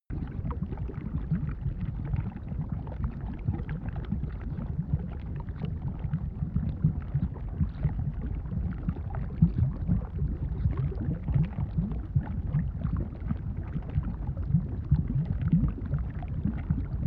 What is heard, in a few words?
volcano loop